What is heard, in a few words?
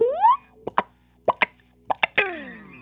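WAH SURPRISE, Funk Gtr & Wah; PlatinumPhattCD2; E-MU Producer Series Vol. 8 – Platinum Phatt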